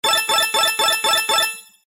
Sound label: hit, jackpot; myjackpot